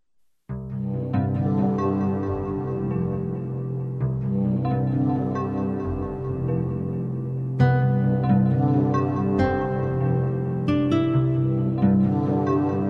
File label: Ambient